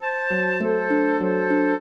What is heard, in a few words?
trio, flute harp; Mozart